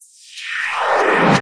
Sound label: Dirty Noise Transition